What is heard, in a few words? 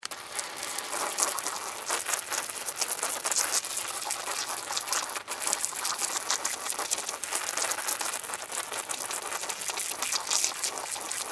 Pesticide Spray, Extermination, Pesticide, Spray, Insect, Bug; Sound Effects:Animals:Insects